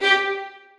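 2nd violins staccato g4, Sonatina Symphonic Orchestra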